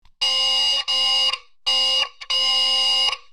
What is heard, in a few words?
intermittent buzzing alarm; funny doorbell sounds